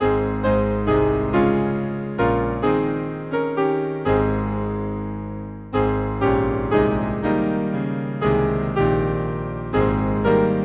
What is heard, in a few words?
piano, Mendon; Come gracious Spirit heavenly Dove